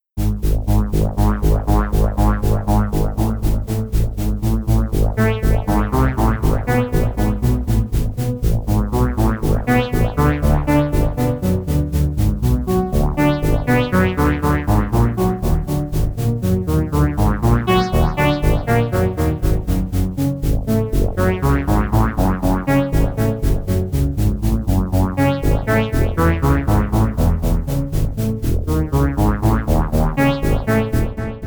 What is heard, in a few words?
fresh voice bass arpeggio